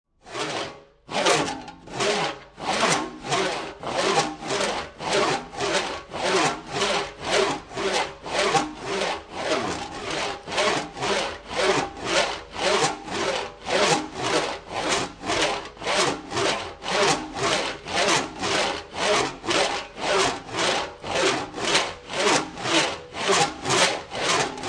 Saw Hand Saw Sawing Wood, Industry, Saw, Hand Saw Sawing Through Wood; Machines